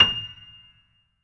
GRAND PNO F7

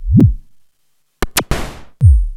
Doepfer MS-404; Sliced

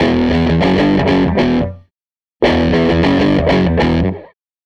SG Guitar Loop

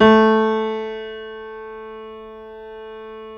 JAZZ A0, Keyboards Acoustic; USB Soundscan vol.17

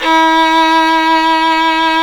SOL VIO 6, Synclavier Strings; ILIO

